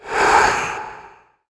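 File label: Ghost Knight Attack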